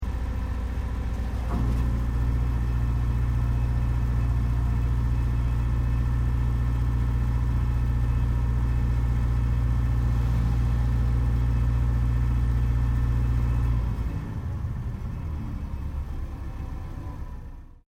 Boat Ferry Car Wisemans Ferry Nsw Arriving, car ferry; Boats; Vehicles